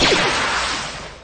republic gunship shoot; lego star wars

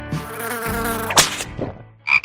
kissing toads

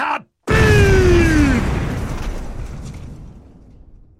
kaboom

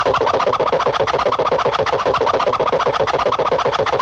rapid swishing

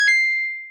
point; flappy bird's

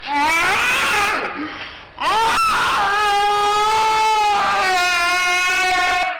upper scream; cry of fear

